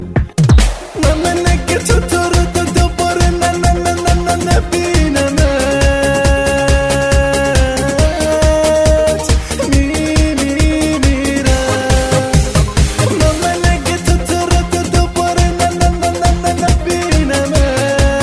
rock iranian ringtone

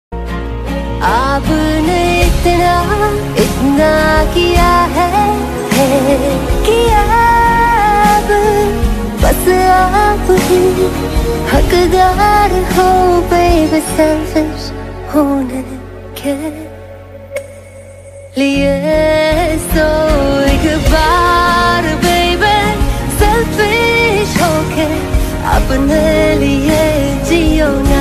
female; race 3

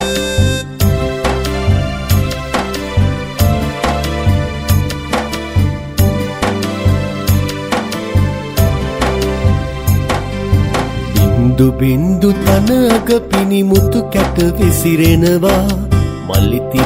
sri lankan song ringtone

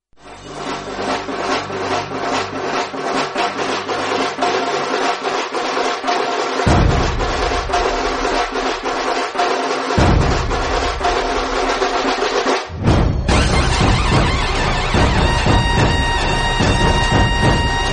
ganpati dhol tasha, ringtone

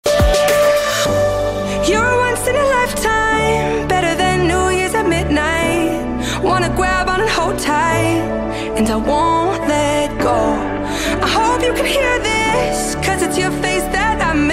ringtone

